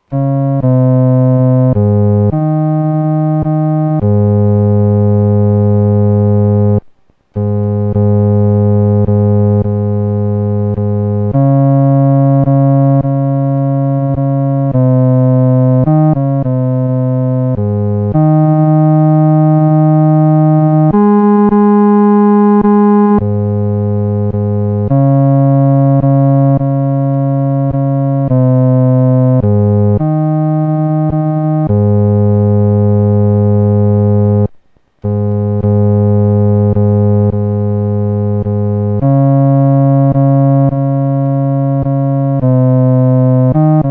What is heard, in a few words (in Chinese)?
独奏 第四声; 岂能让主独背十架